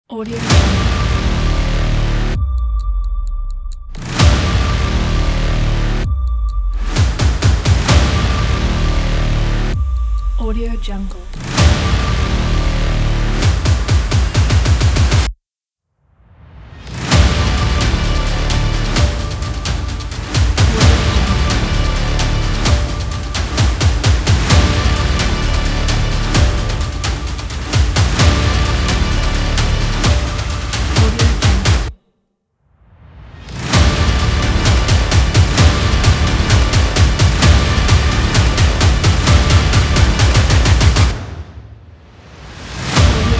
aggressive explosive trailer

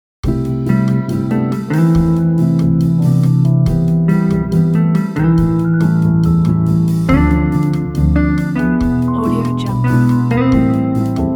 vintage jazzy silent night

